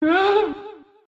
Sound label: whine; pepperman